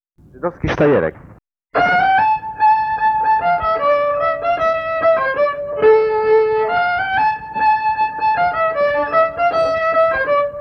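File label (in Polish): skrzypce, żydowski sztajerek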